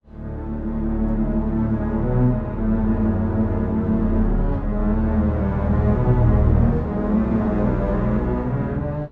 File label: strings, pitchshift